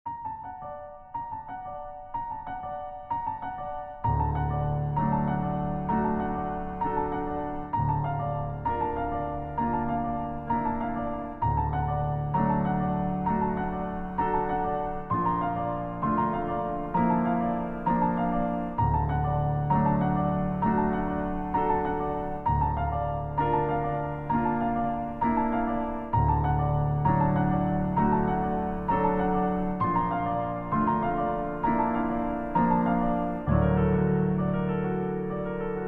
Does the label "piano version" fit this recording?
Yes